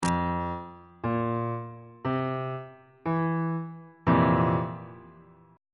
e sharp major 7th suspended 4th chord on bass clef